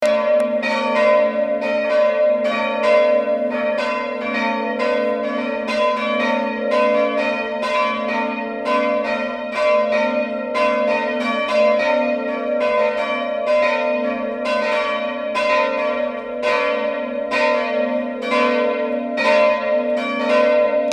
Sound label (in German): Glocken; kath bommern